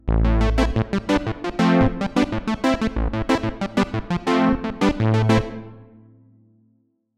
Jupiter 8 warm chord; opx pro3

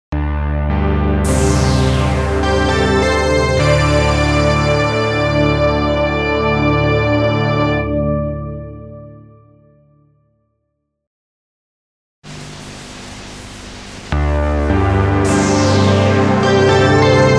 80s video ident

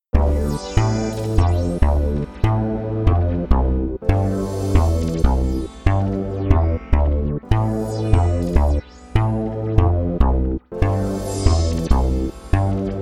bass

